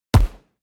stamp; soundscrate

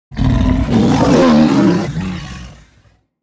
tiger roar; soundscrate

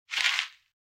footfall outdoor step; soundscrate

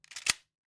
Shotgun Loading; SoundsCrate

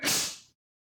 snake attack

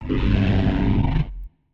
T rex; soundscrate

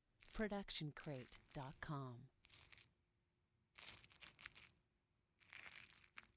squishy long; soundscrate

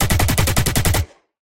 Automatic Weapon Fire

